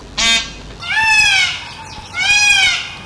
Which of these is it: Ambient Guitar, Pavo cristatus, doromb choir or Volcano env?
Pavo cristatus